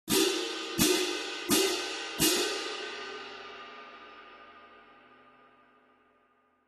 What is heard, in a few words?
20 K Constantinople Medium Light Pair, cymbal